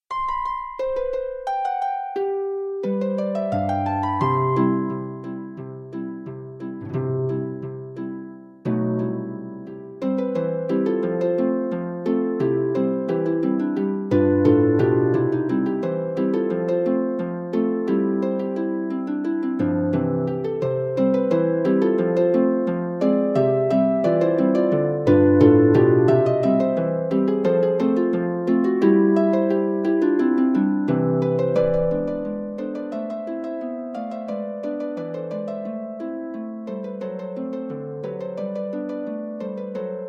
Ragtime, harp

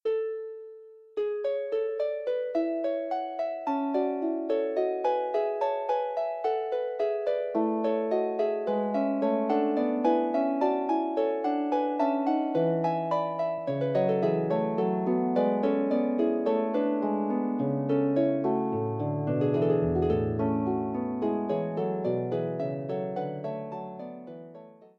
Fugue, Harp

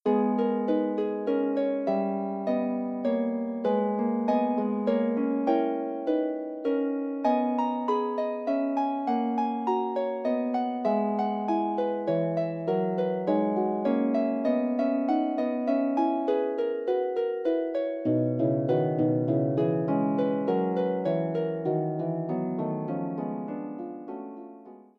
prelude, Harp

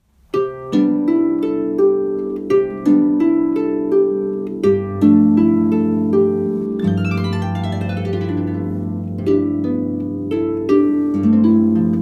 Silent Night Lever, harp

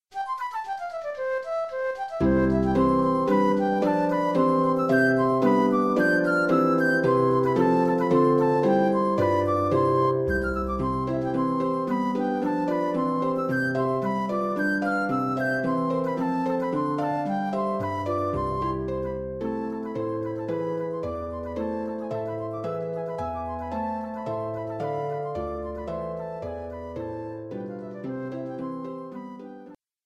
Folk Sounds, the penillion singers melody; Sain y Werin; Cainc y Datgeiniad

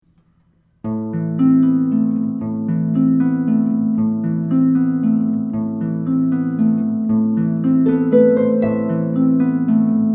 Waltz, harp

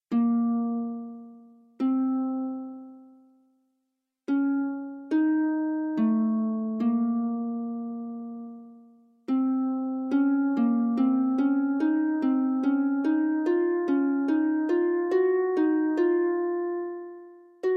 Fugue, harp

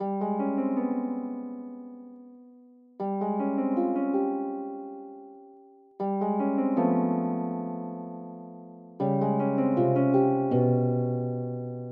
Flute

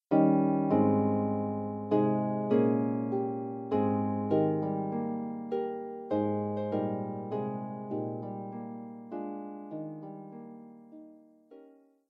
Harp

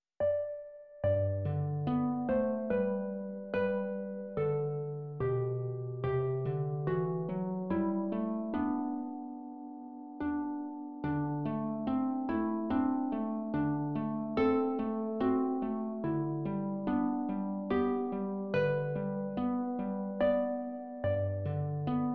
Carols for Solo Harp

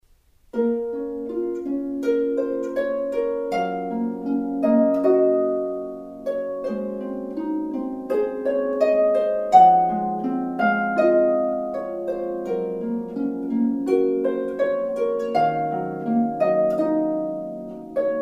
Reminiscences for Harp

